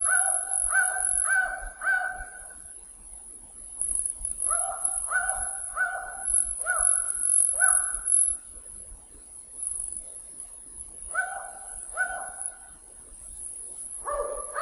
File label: mystery sound two animals with noise reduction